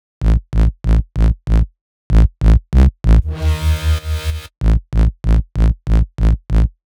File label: TEK BASS